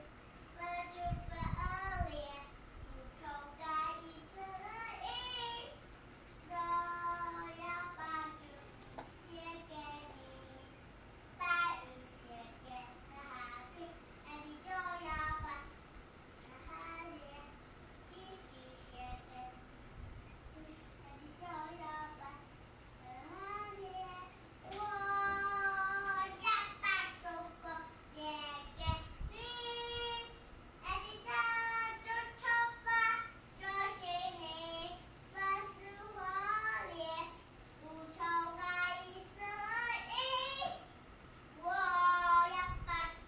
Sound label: sing with shower